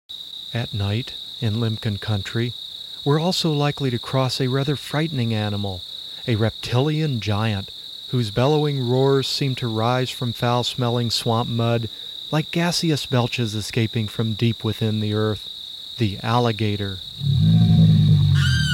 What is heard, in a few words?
Alligators and Crocodiles, A Guide to Night Sounds